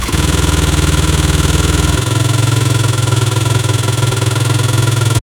v6 engine starts; automotive

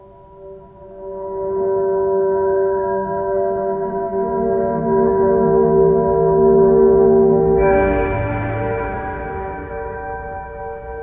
generate deep instrumenta, meditative

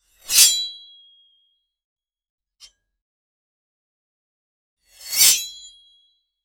sword unsheathing metall

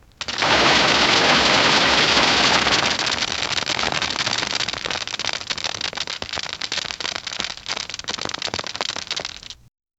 loose film rapidly unspoo

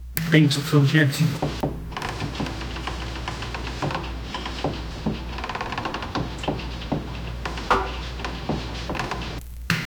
distant creaking wood

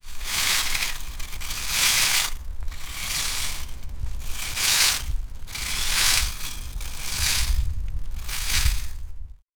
soil dragging sound from, agriculture

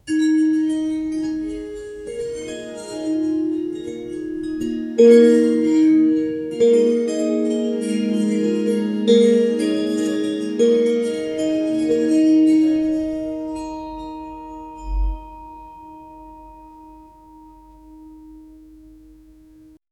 peaceful instrum

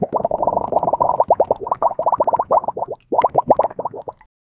gel bubbles grow

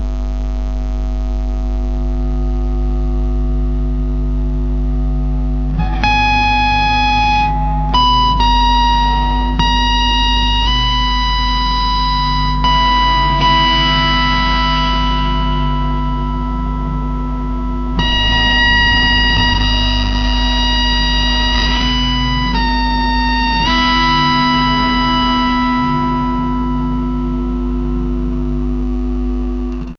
guitar squeaks acoustic guitar

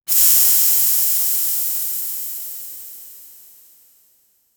steam low quality sound t, steampunk

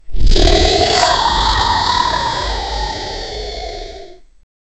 dragon roar

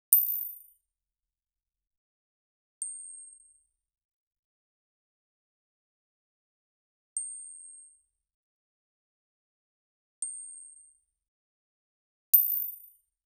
golden coins collect effe, money

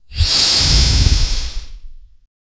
futuristic armored helmet, gasmask

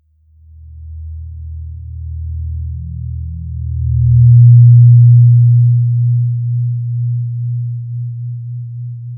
a clean digital soundscap; mid frequency

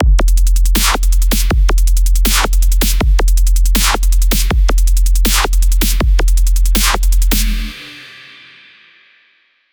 160 bpm brutal dubstep

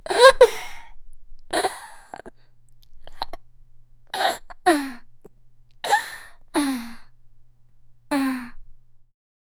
little girl gulped hard

